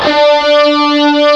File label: guitar D4; AKAI S-Series CD-ROM Sound Library VOL-8; SET#1 GUITAR